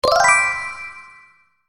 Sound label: new Level; watermelongame